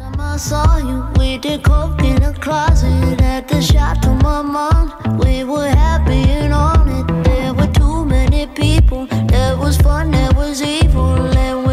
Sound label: English Ringtone